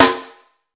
small reverb acoustic snare sample c key

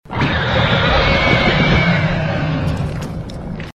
roars; weird scary roaring

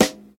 prominent rock snare sample g sharp key; snare drum samples

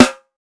strong bottom end steel snare drum sample c key

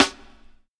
roomy acoustic snare sound c sharp key

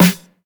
acoustic snare sample f key; snare-drum-samples

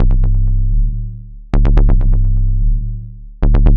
techno short bass sine mid; instruments; loops

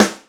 thick steel snare drum sound g sharp key

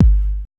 wet bass drum single shot g key

